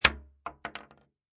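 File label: Bullet Shell Drop Shotgun 12 Gauge Hollow Wood; empty Shotgun 12 Gauge shell falls on hollow wood.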